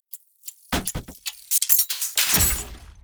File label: death ball; orianna